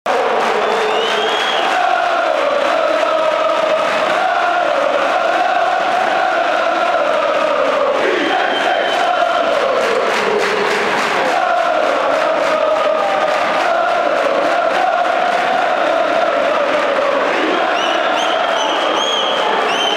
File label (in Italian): Oh oh oh oh oh Firenze